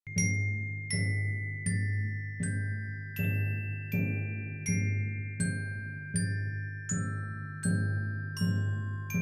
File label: Glock